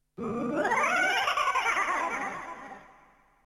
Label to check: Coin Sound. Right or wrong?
Right